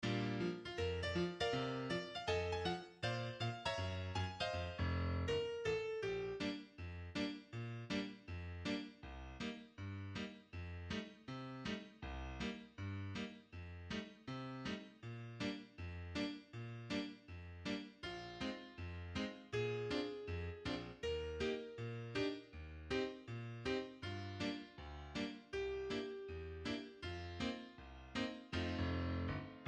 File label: PIANO ONLY; 2 part Bb